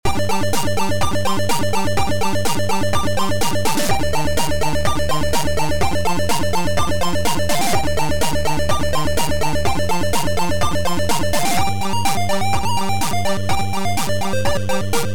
Amiga Chiptune

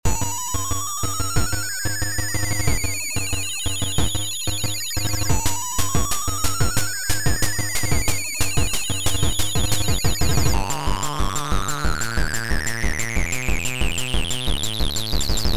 Techno, music; for Digital Maker

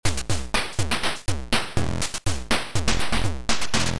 Drumsect

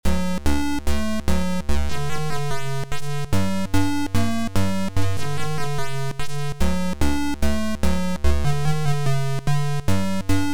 TANGO

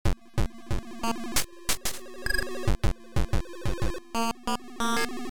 Trance

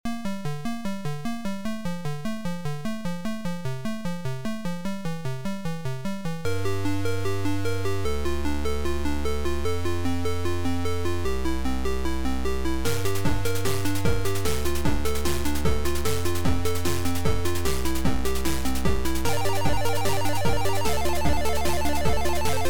Dance; Robert Pain

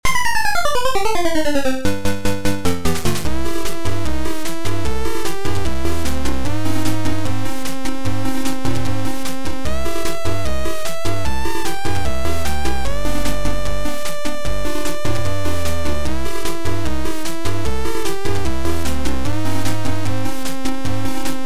Game music; Tomkin; Santa Goes Psycho 2

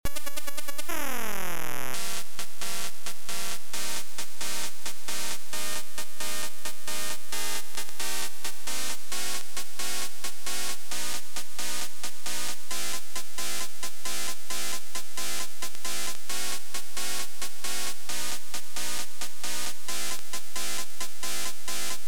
Beeper 3 Channel Music; TDM; Tim Follin

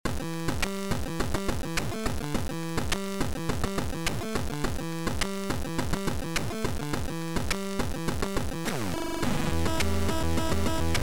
Bit Energy

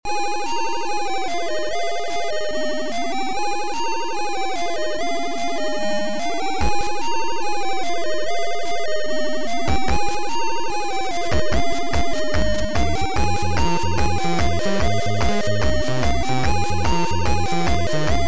BUSY BASS

